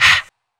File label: Vocal Sharp Breath; One Shots; Ableton Live 12 Lite; Core Library